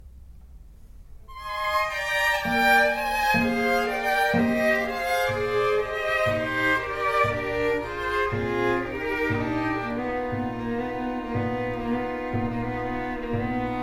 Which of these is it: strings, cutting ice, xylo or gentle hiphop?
strings